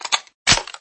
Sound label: SG550 clip in